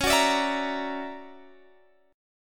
D Augmented Major 9th Db, guitar

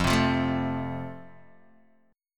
F Major, Guitar Open D

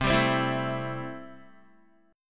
C 6th, Guitar; strummed; 8,10,10,9,9,x

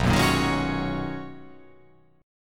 B Major 13th B down

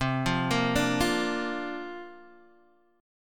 C 9th Suspended 4th, Guitar, Open D

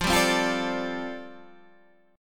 G 7th Suspended 2nd Suspended 4th F chord

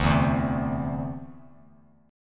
D 7th Suspended 2nd down Bass Standard; D7sus2 strummed

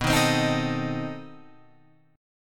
B 7th Flat 9th B down Guitar Open F; B7b9 strummed; JGuitar

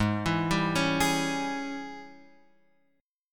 G sharp Major 7th Suspended 4th Sharp 5th; G#M7sus4#5 Chord {4 7 5 6 X 4} arpeggiated

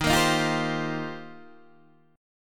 Eb 6th Add 9th, Guitar, DADGAD

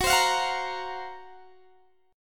Gb Major 7th Flat 5th Gb, Guitar Standard; strummed; JGuitar

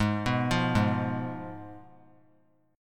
Ab Minor 6th Ab, down, 3C, 3F, 3A, 3D; Abm6 arpeggiated; JGuitar

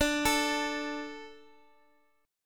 D 5th, Guitar Drop D

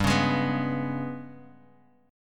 Ab Minor Major 7th Double Flat 5th

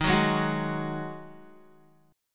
Eb Minor Double Flat 5th, Guitar; strummed; chord; JGuitar

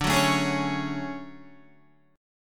D Major Sharp 11th, down Guitar; strummed; JGuitar

